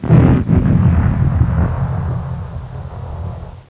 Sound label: battle